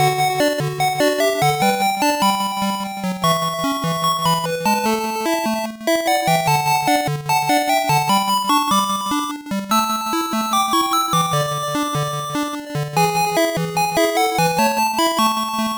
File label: Game Start; Soreike! Anpanman; Anpanman to Tanoshii Drive; Pico